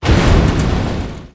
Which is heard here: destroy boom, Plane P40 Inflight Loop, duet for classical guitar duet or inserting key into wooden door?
destroy boom